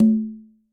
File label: Tumba A Open, Conga; Latin Percussion; Drums; Drum Hits; Ableton; Factory Packs